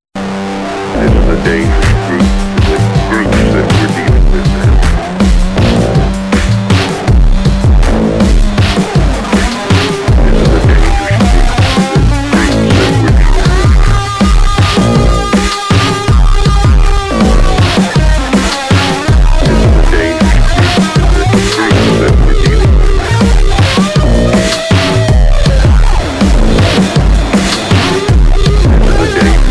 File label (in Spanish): GUITARRA